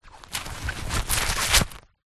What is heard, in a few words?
sport goalkeeper glove put on